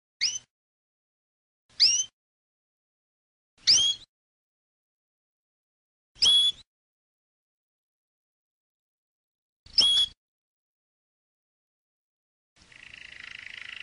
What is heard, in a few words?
Canary, Bird Songs